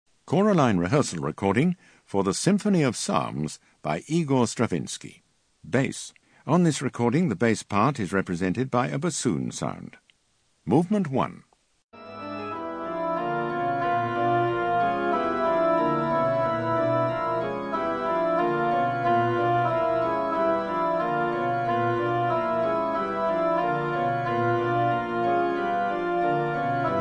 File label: Bass; Stravinsky Symph of Psalms